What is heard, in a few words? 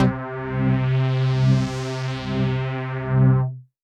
Pad C, Synth Prophet One Shots; Electronisounds